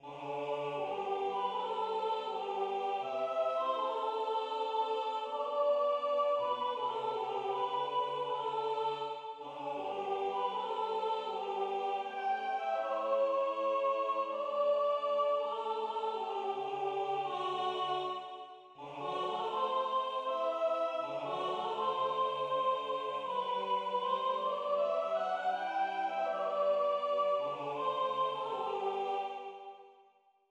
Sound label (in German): Sopran; Fruehlingsgruss